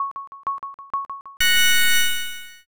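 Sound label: countdown new year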